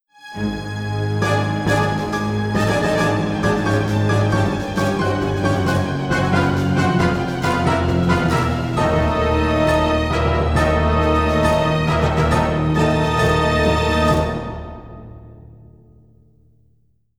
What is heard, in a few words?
Fire!; anno 1503 gamerip